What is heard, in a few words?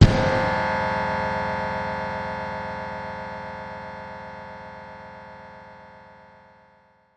Hard Impact Tone Ring Sweep